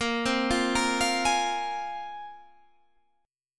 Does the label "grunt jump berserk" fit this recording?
No